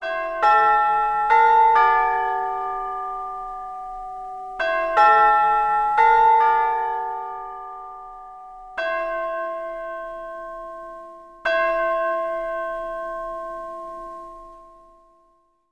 Town Hall Clock Bells

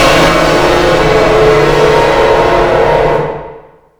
Jump scare

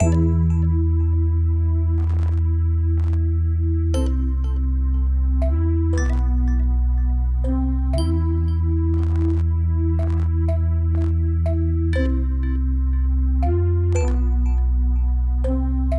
Videogame Title Screen Music

